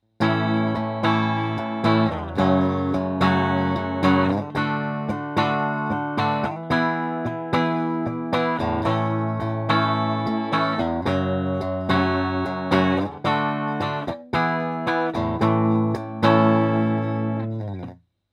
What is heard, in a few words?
JC120 push, guitar